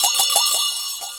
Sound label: BO RUB; INIS - Opium; PERCS-KIT